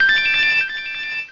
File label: Quiver Dance; PokeRogue; battle anims